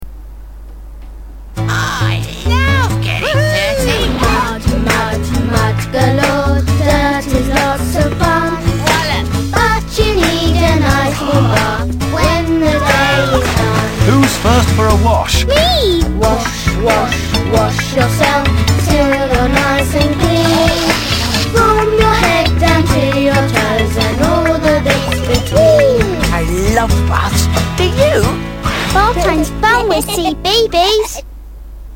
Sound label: Bathtime Song, children songs